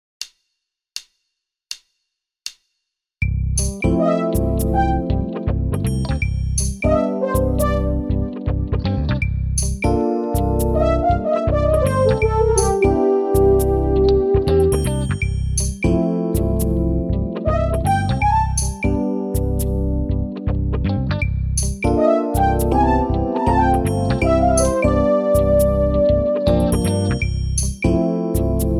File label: Backing 16th Shuffle; V-Drums